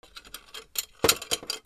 BATHROOM

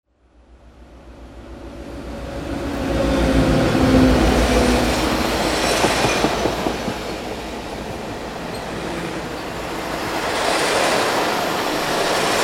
TRAINS

